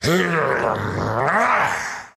Giant Recoil

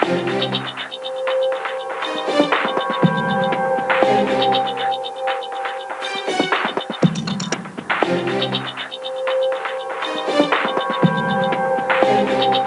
BGM Movie Dangerous

